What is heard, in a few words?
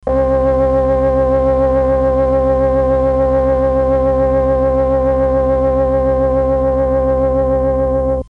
m300 organ, Mellotron M300